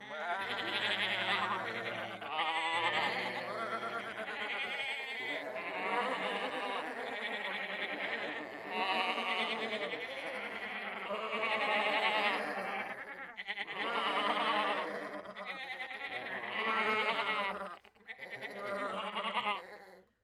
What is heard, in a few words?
Human Crowd Sheep